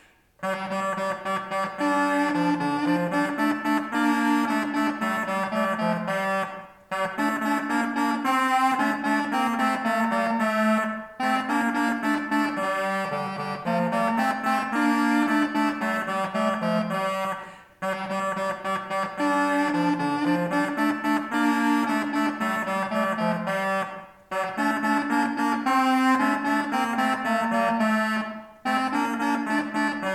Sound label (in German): kortholt